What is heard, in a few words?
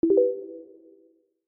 Message